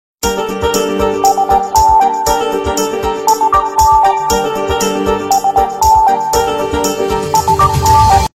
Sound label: Phone Calle